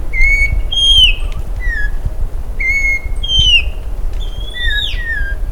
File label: Icterus croconotus